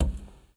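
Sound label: Foot Metal Roof Bare Walk; Lucius III; pc linux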